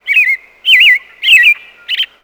robin

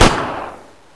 30cal fire; WAR

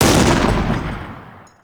axis anti air; WAR

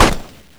30 cal; WAR

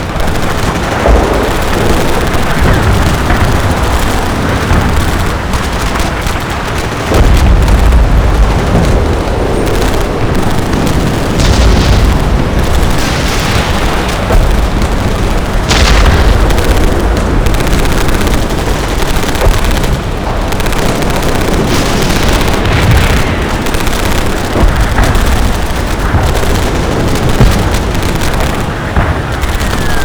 dday battle; WAR

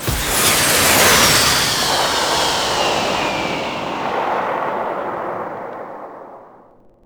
nebelwerfer wet; WAR

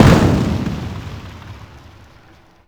medium impact generic; WAR

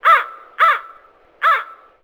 crow

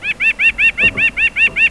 wren

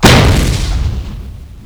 stug fire cannon; WAR

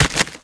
bramble break; WAR

